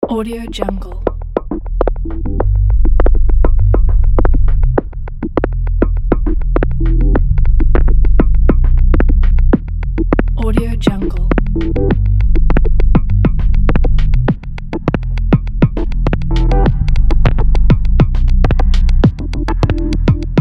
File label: abstract landscapes